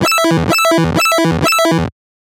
Riddim Melodic Hit - E; One-Shots; Boss Battle; Ghosthack; Riddim Essentials Volume 2

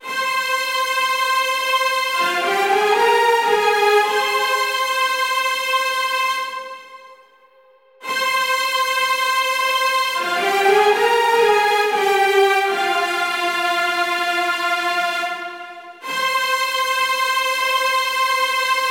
String Section Melody - Fm 120Bpm; Construction Kits; STEMS; Ghosthack; SkyRealm; Kit 25 - Vvardenfell

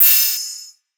Hihat; Ghosthack Advent Calendar Day 4

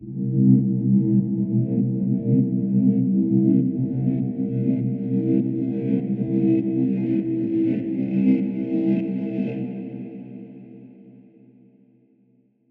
Dark, Deep, Dark

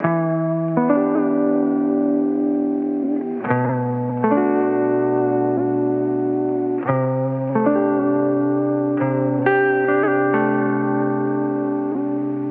Electric Guitar Wet - 70 BPM E Min; Melodics; Cymatics; Eternity Sample Pack; Guitars - Electric

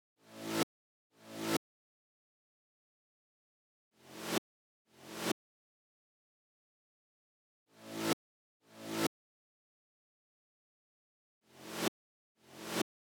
128 BPM A Min Reverse FX; Drop Loops; House; Cymatics; Paradise

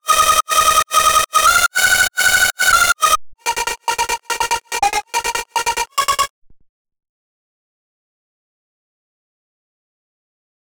143 BPM D# Min Hype Lead; Melody Loops; Loop Stems; Cymatics; Hyperbass Pt 2

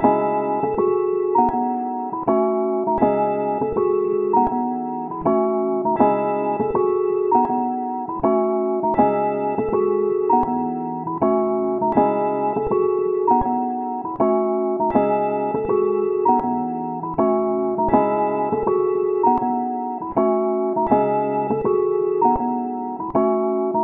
161 BPM C# Min, Melody, Trap; Cymatics; 2022 Melody Collection; Trap Pt 1

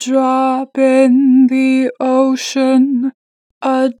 A drop - 2 bar vibrato, Vocals, Natural Vibrato; Harmor Vocals

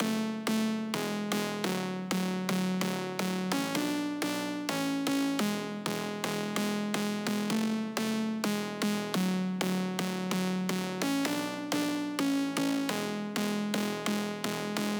128 BPM F# Min Chords; House; Drop Loops; Cymatics; Glider

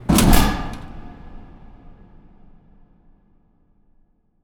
impact hatch; Hits

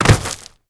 BF On Gravel; Fairlight Sound Lib

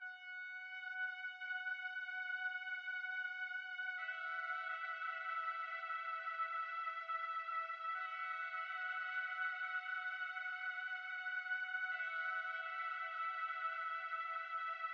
121 BPM C# Min Drone, Pop; Melodies; Loop Stems; Arena

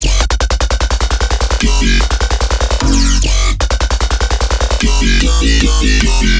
Bass Loop F# Droid, Dubstep, 150bpm; Ghosthack; Dubstep Sound Arsenal

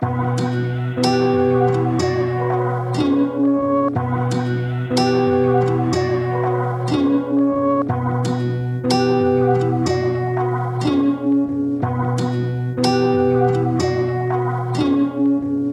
122 BPM B Min, Melody; Cymatics; 2022 Melody Collection Platinum Expansion

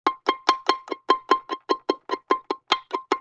Pizzicato Loop, Vintage Cartoon; Musical Elements

